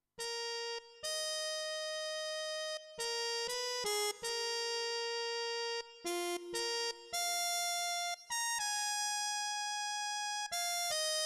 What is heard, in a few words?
Muted Trumpet